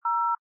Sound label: DTMF 1